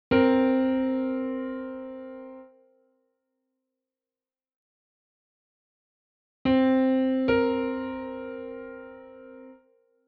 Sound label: interval